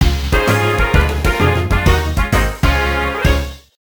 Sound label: Voltorb Flip game over; Pokemon Essentials; PKMN Estelar 1.3